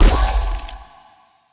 ball hit cold hard; CrossCode; battle

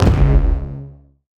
hit wave hard; CrossCode; battle

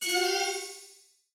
crystal enemy; battle

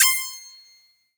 bling; CrossCode; battle

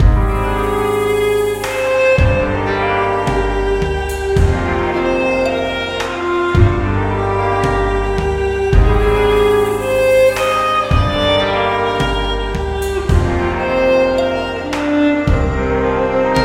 song snow; Katana ZERO

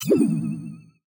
element ball hit

